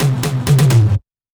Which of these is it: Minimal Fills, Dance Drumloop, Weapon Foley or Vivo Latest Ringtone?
Minimal Fills